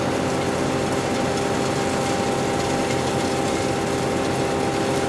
Silo

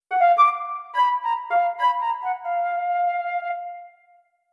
flute

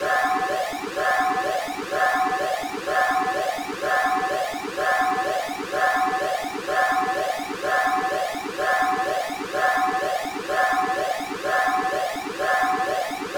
Music Alarm; TurfTakeover; MiniGame

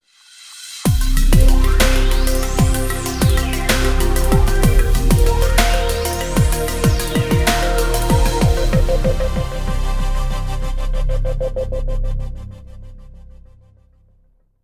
Sting; Test Drive Unlimited; PS2

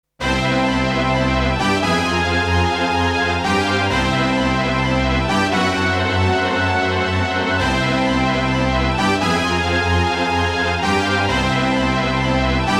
Title (Synthesizer Version); Super Famicom Magazine Vol.10

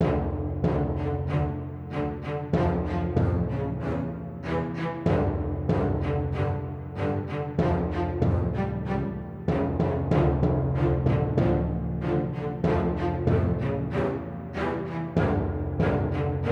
Eerie Presence; Emerald Forest

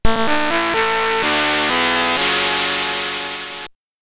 Start Event (Beeper); Future Games; ZX Spectrum

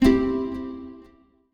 Success Guitar Very Fast Three Note Delay stereo

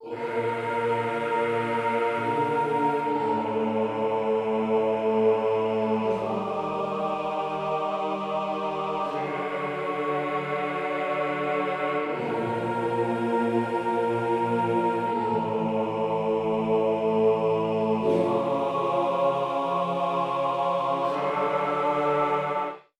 Church; Buried; PS3; Xbox 360

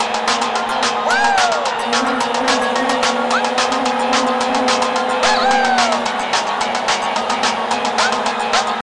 sport tv

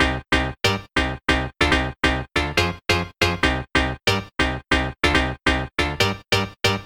Boss Chords

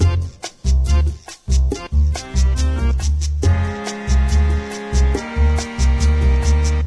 Italian Music; Diner Dash 2 Restaurant Rescue

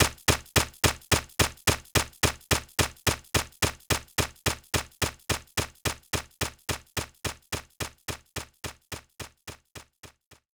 swords

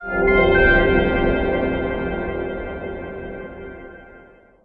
tree sting

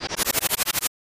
Swoosh

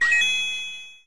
item finder; pokemon reborn e19 2022

